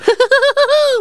HOO HOOOO

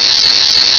ELECTRIX, machines

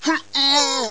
AHG

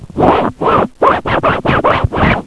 SCRATCH

